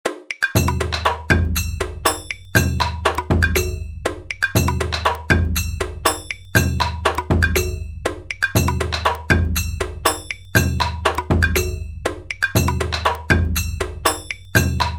Japanese Drum Test

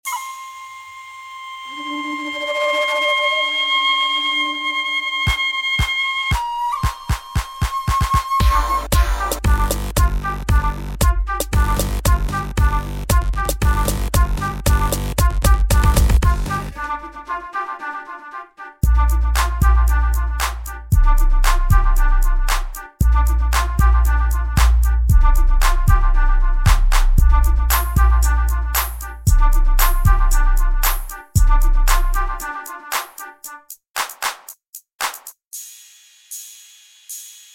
SuperBass trapper

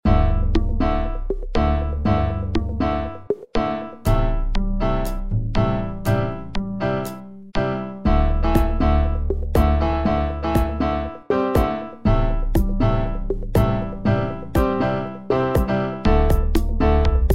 Classical Piano Remix

